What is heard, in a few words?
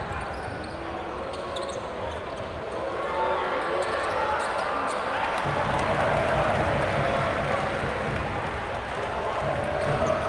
international basketball game boo crowd clapping in unison and chanting drum beat shoe; shoe scraps; Sonniss GDC